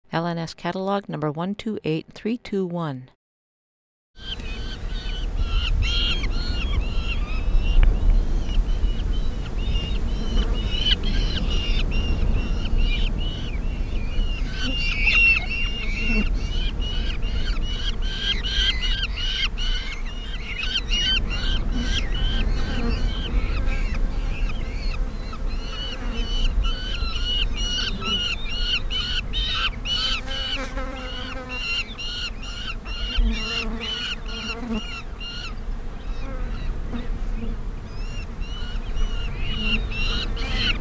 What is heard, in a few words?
black falcon